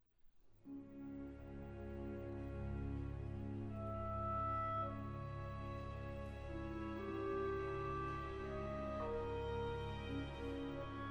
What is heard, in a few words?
Requiem Aeternam, Symphony orchestra; Conductor - Marc TADDEI; Part 1